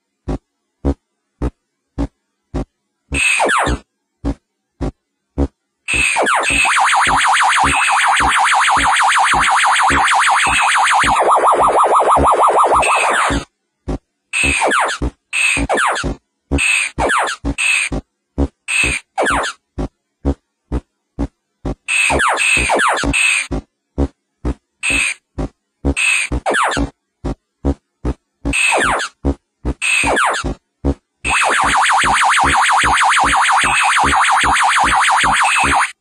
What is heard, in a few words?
Arcade Game 1980s SOUND EFFECT; Space Invaders